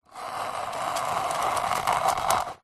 sport table tennis portable retractable net pull open out plastic housing slow; zapsplat